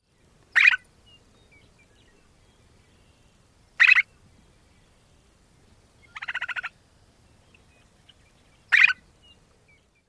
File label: flush call